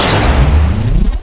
EXPLODE

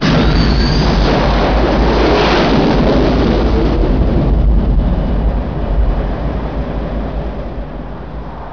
CAT LNCH; VERTIGO

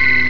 shrill

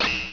CLICK

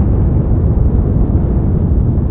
ROCKET; VERTIGO

